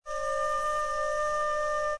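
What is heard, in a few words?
C sharp 5 with D5, flute multiphonics; modern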